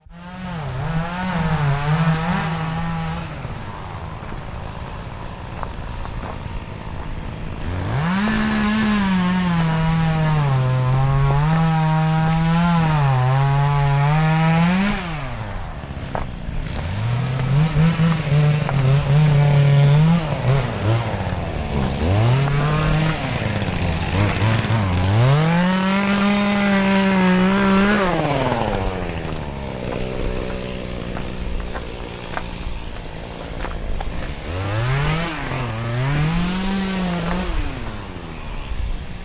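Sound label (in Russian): вырубка деревьев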